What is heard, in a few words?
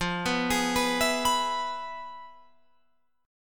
F Minor Major 7th Flat 5th Guitar Open F; FmM7b5; arpeggiated